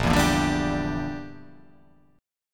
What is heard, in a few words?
Bb 6th Flat 5th Bb; Bb6b5 strummed